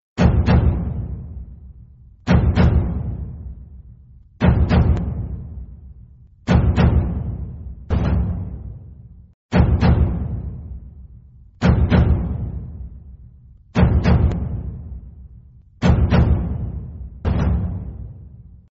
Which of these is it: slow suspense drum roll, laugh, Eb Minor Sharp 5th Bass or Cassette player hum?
slow suspense drum roll